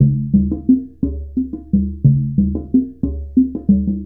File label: Mallet Percussion; Sonic Foundry (Sony Creative Software) - Essential Percussion